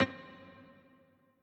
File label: piano riff intro